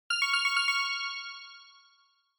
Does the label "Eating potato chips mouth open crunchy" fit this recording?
No